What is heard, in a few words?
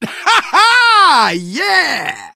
positive noise, survivor voice, manager